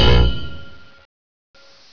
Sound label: fast fire start; dusk